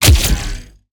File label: fuse; Mindustry